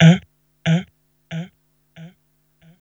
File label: VOX, Hip Hop R n B; USB Soundscan vol.19; AKAI; HHFX 89